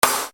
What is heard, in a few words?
Snare Dr